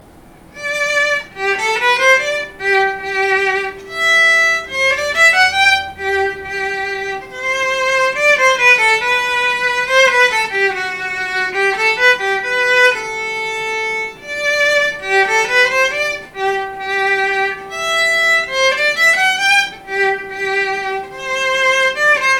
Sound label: minuet, violin